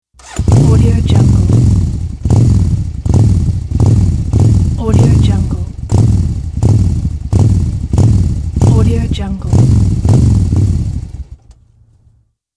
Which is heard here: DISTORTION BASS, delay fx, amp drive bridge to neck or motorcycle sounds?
motorcycle sounds